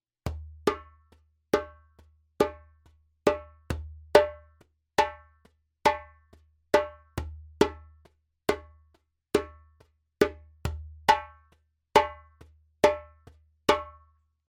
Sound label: djembe; djembebog oevelse